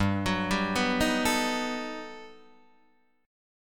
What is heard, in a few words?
G Augmented Major 9th, Guitar, Open D